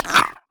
wolfman grunt; LoL; monsters

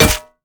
basic attacks; Dr. Mundo; TPA Mundo

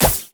bandage toss; LoL; badrobotamumu

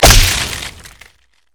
stacked deck; LoL; twistedfate

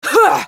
spell cast effort, en US; Sivir

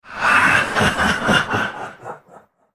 ultimate effort; Nocturne

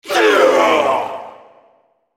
dying; Malzahar

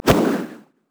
frostbite; LoL; anivia

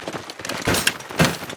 dance loop; LoL; twitch

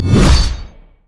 crit attack; LoL; tryndamere

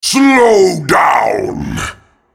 spell cast effort, en US; Rengar

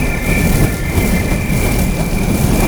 foxfire loop; LoL; ahri

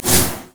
virulent slash; LoL; skarner

